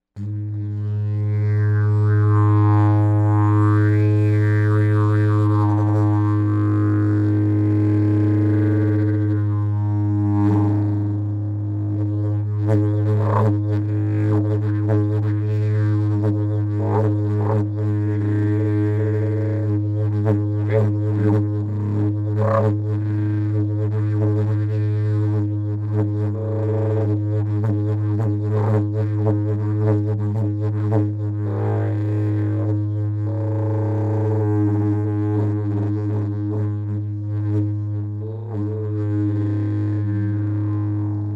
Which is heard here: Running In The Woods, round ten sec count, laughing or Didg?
Didg